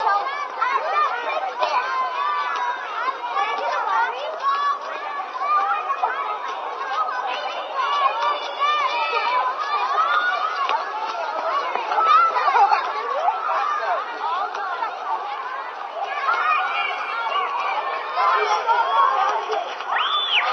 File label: children playing